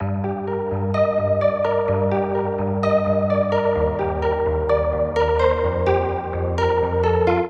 synth loop virus ti2 arpeggio suspense 128 G-D-F

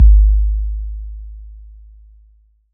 Kick Analog Mono

